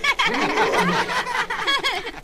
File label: laughter children; ooh ahh